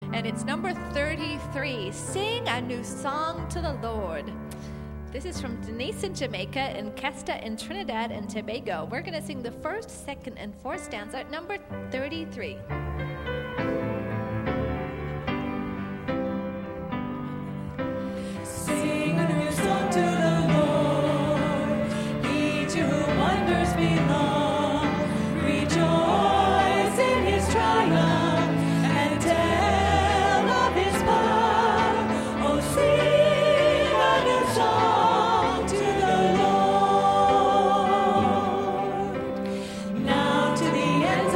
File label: Sing a New Song to the Lord, Choir Hymns; Stanzas 1-2; SDA Hymns; Sabbath School